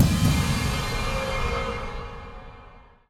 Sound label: Hi Hit